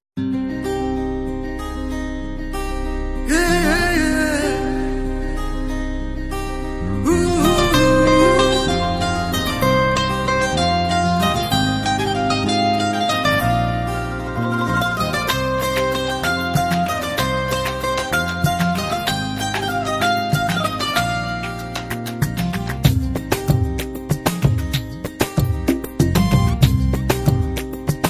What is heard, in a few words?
BGM Telugu Ringtone